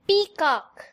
peacock; grade5; cls of vert

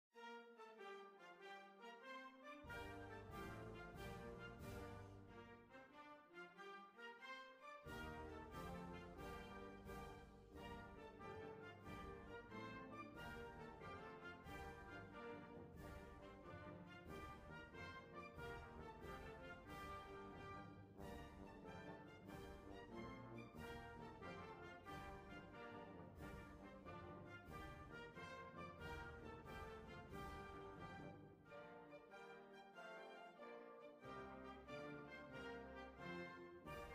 National Anthem Tune; rastrya chinha

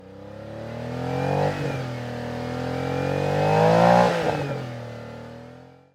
car engine; grade7; distance displacement

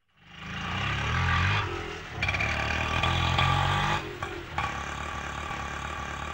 TUKTUK ride sound effect; dyslexia

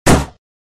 balloon pop

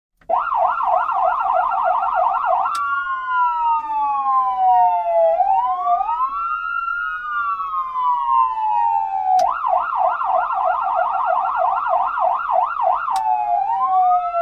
ambulance; transportation

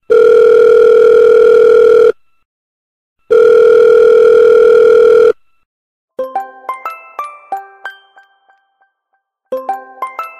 telephone call; grade1; sound sci

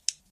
click; grade1; parts of a plant

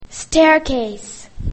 staircase; grade1; english; basic sounds

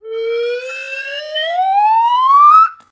slide up; dyslexia; writeka

